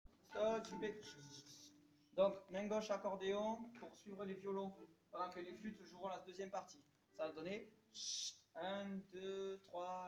MG diatos partie 2; Megastive; Musique d-ensemble; Cercle circassien

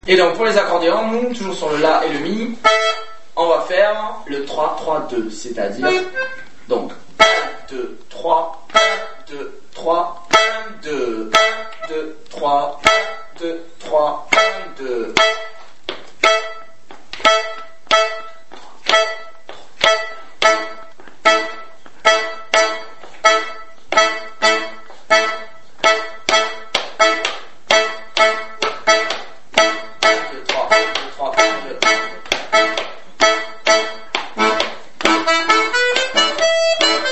Polka provencale (rythmique accordeons)

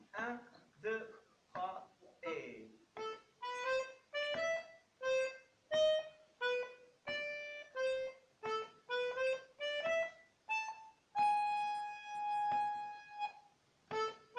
version lente

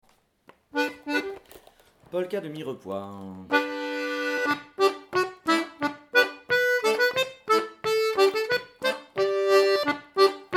Polka lent; de Mirepoix

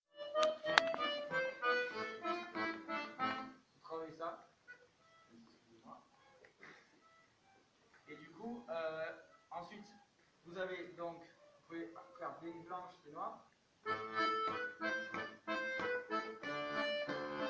proposition impro diatos

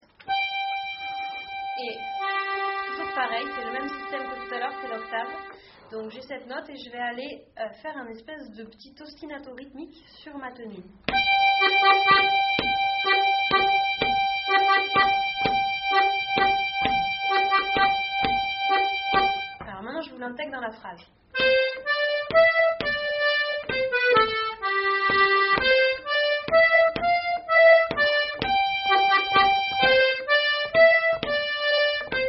Bourree VARIATION; rencontres-2014

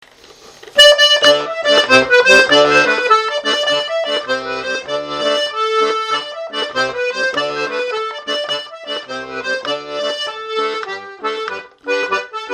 rondeau de Gimont

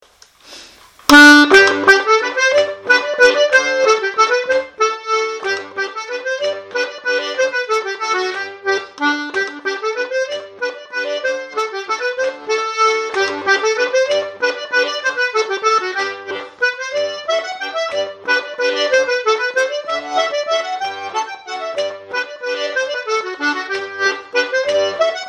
Bourrée; de la Marguerite; Musiques et danses traditionnelles

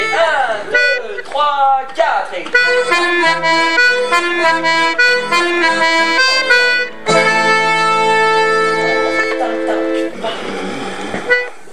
final Ensemble; Las fatmas

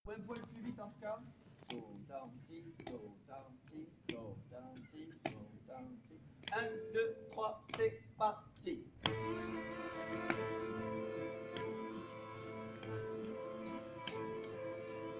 violons plan; Valse; La cancon

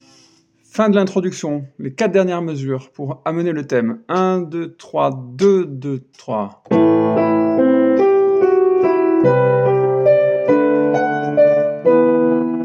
intro violons et accordéons; Intro violons / diatos; Valse 4ème R

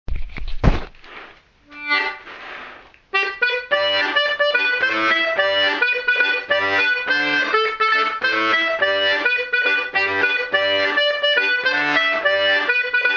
Mazurka; rencontres-2013

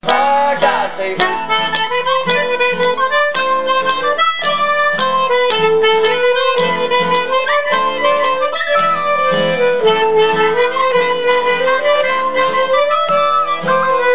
plan guitare (la fa re mi); Chapelloise

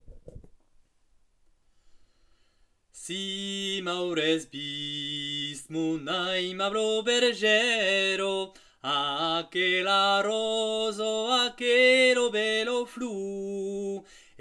mélodie en sol; Rondeau 2021; Si m'auretz vist

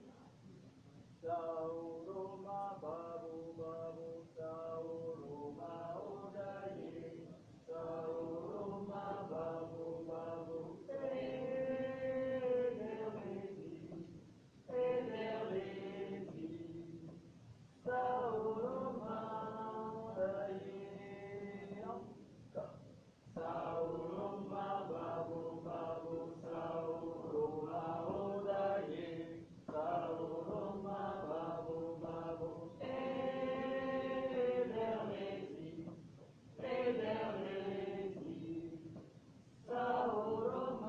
voix 2 chantee; Ederlezi

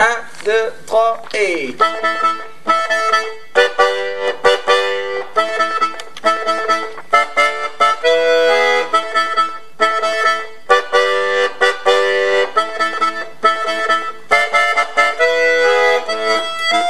diatos; Chavalen Romalen

